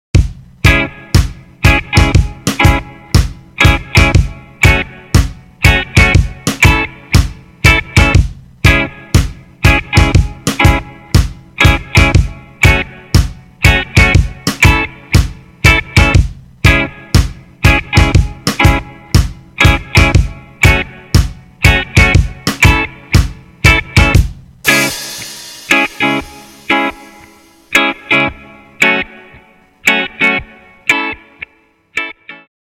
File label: The Reggae Strum Pattern; Strumming 101